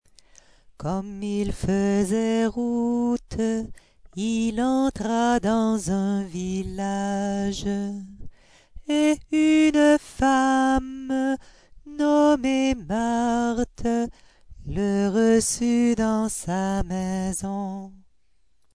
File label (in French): recitatif; Lc 10, 38